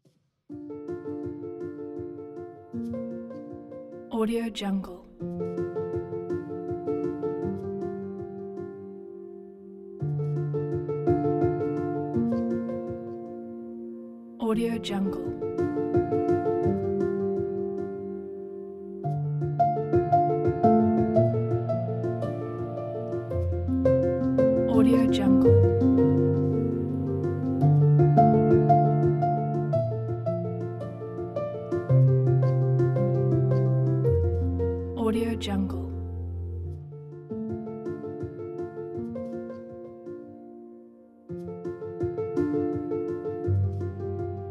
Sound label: soft and easy piano